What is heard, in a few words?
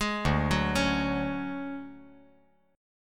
D Major Sharp 11th D, chord